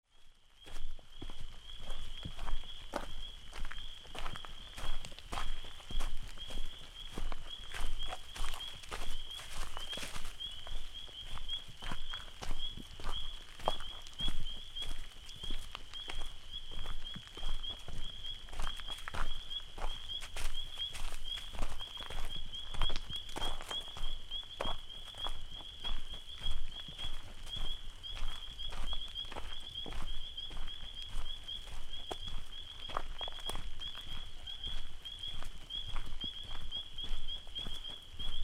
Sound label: Walking near Lake; Wisconsin 2019